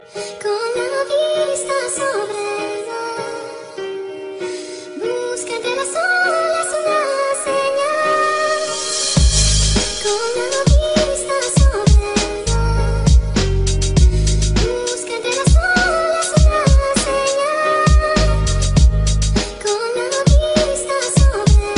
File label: spanish love ringtone